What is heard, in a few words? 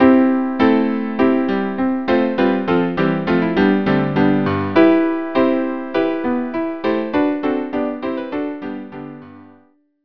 quartet; Sailors Song